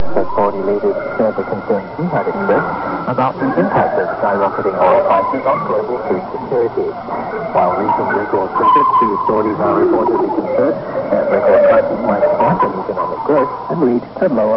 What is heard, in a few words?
6.08000MHz; Radio Singapore International